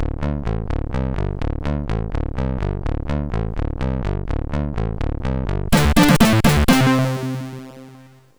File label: Jingle, MOS6581R4; Rambo